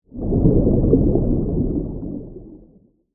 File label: Sea Creature Whoosh, Horror, Giant, Tentacle, Under, Water; Monster Sound Effects; BlastWave